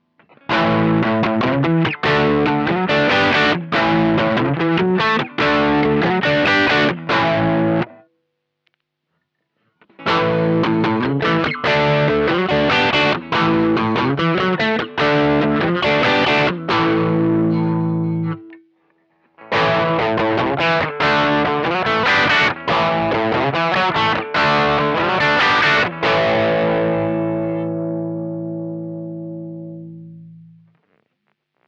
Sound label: Classic crunch